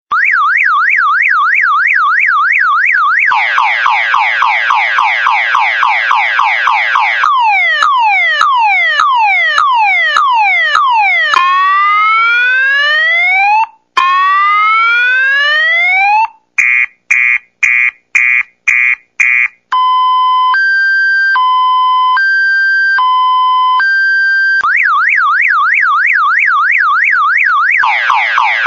complete car alarm

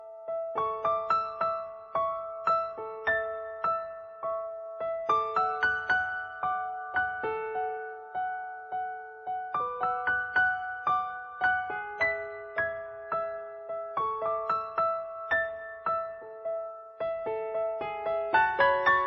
piano theme; Electronica; Forrest Gump

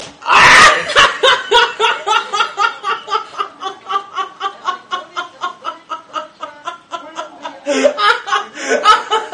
fat kid laughing; Funny

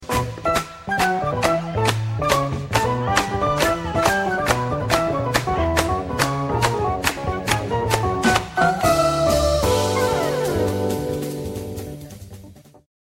spain jazz

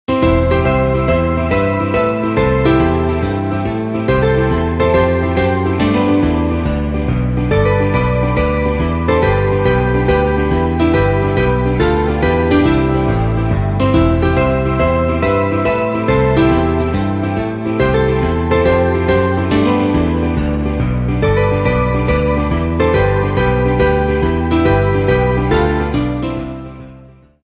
ringtone

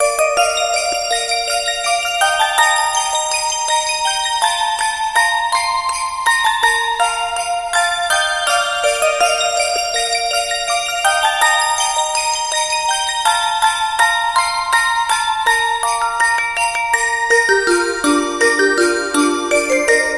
ringtone

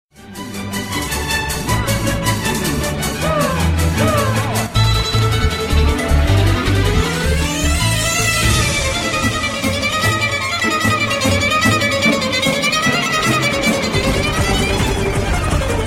violin mix; Electronica

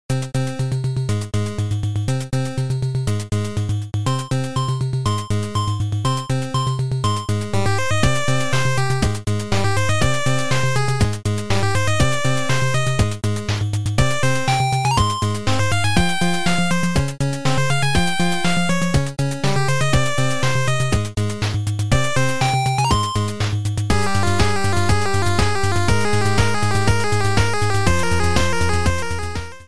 8 Bit; X Men Theme

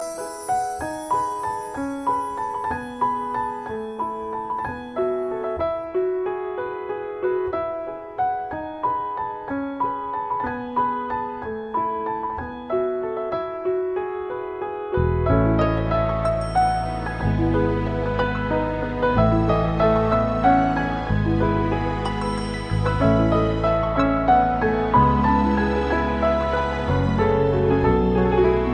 Angel Theme Piano

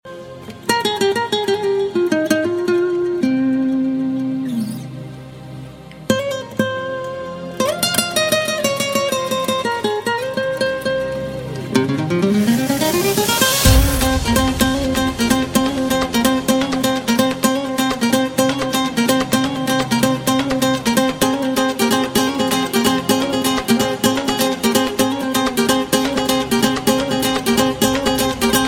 guitars; Latin

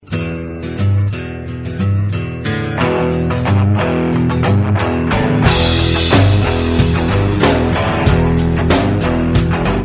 country rock; Country Rock 3d

